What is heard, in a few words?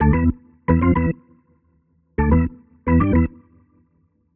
jazz keys Organ 110 B-line n Riff E